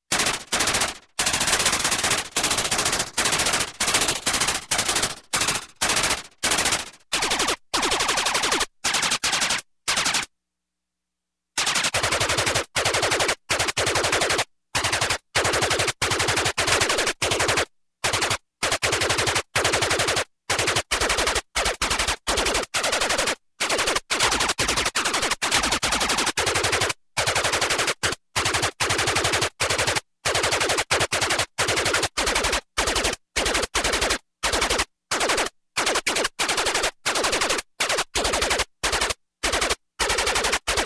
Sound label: Space Machine Gun, Electronic; Sound Ideas 2000 Series